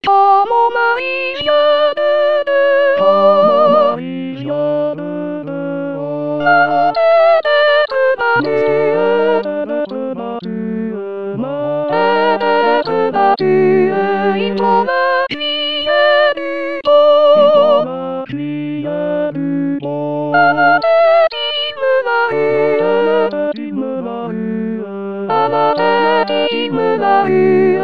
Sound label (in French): Quand mon mari Sop